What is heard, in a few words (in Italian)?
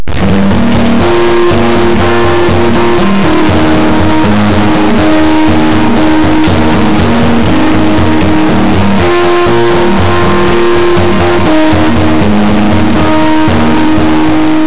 musica newage